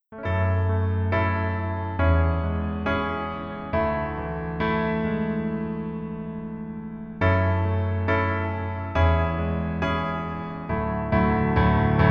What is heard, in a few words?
Piano